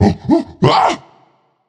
nv fear, vox; smite; voicepack; vulcan

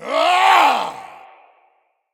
vox lunge; smite; odin

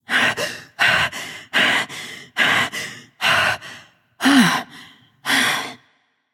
breath light; smite; voicepack; hel

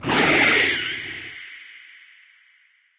alien lobby landing; smite; serqet

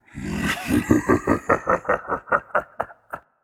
laugh, voicepack; smite; jorm

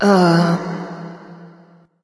confused light; smite; voicepack; hel

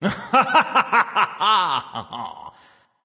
laugh; smite; khepri

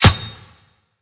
impact blade; Smite; Cabrakan

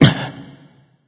grunt hit, voicepack; smite; vamana

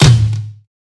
drum; smite; god raijin

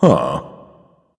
confused; smite

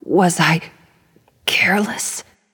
death; Smite; athena

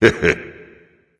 heh heh, voice; Smite; ymir skin9

